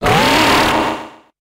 Grito; Wyrdeer

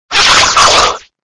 Grito de Clobbopus; Gritos de Pokémon de la octava generación

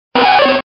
Grito; Nidoran♀

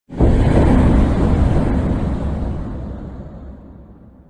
Grito; Rillaboom Gigamax

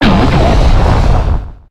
Grito; Landorus

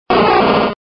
Grito de Pineco

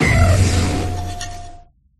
Grito; Electrofuria

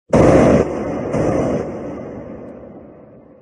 Grito; Snorlax Gigamax

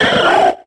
Grito de Sealeo

